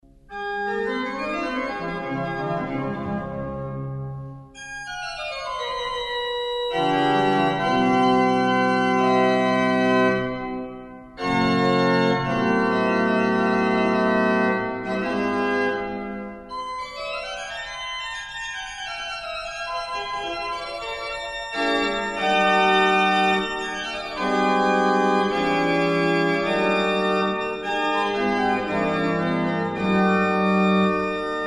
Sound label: toccata d major presto; bach